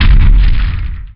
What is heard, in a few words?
collision medium; Allegiance